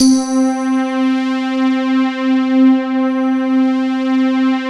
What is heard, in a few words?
SY77, PAD; Alpine Volksmusik